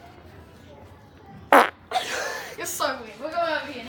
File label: fart in school